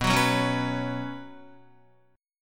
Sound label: B Suspended 2nd, Guitar; Bsus2 strummed; 4F,4A,5C,5G,5C,6E; 6,9,6,6,6,7; JGuitar